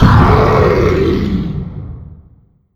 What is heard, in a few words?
Jumpscare; Skinstealer; backrooms 2d android ios online windows gamerip